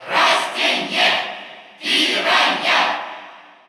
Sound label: Cheer Russian; Piranha Plant; SSBU